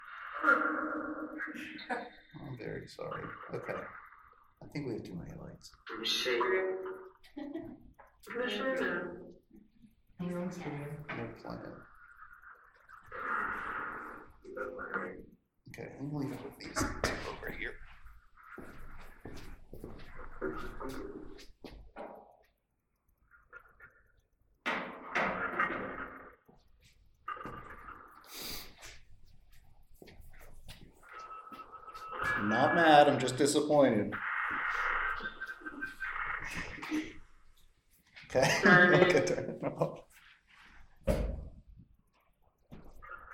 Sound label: Mean Teacher Room Phasma Box; poasttown elementary school investigation